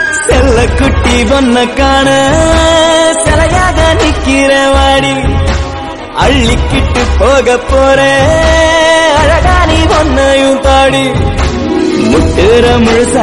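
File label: background music, ringtone; rajini murugan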